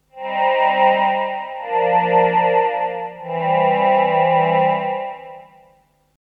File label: vocal